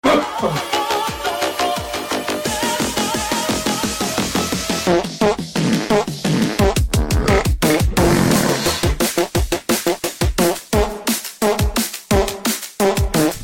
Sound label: toilet dnb